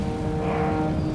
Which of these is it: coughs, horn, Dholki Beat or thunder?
horn